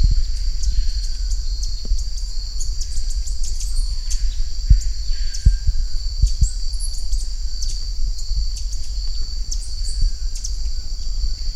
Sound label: bird